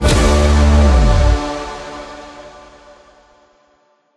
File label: Music Outro; Fortnite